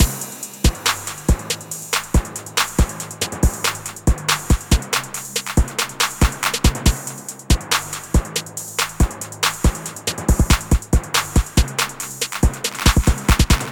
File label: Bpm70 Cm Blow Out Mixed Beat; Prime Loops Urban Dreamz